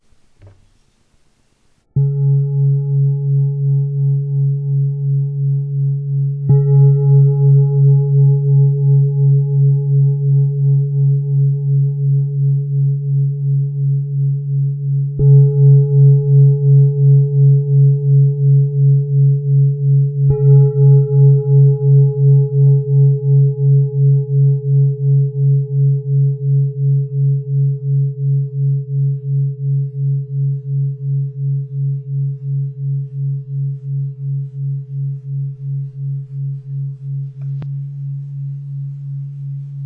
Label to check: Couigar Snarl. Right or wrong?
Wrong